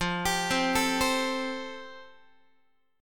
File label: F Major Add 9th, Guitar; Fadd9 arpeggiated; JGuitar